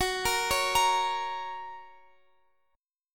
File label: Gb Major Gb Guitar Open F; arpeggiated